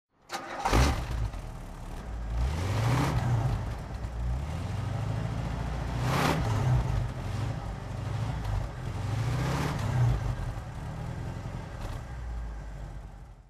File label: bentley t1 throttle; Bentley T1, 1971; Engine sound; V3D/ ClassicarImages; Marc Vorgers